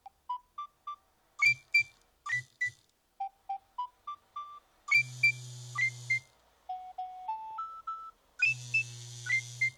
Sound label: nokia 6100 ringtones danube